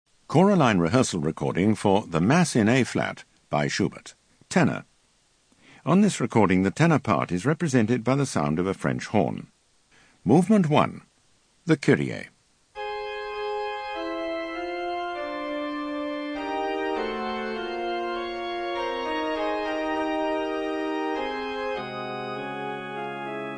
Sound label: Tenor; Schubert Mass in A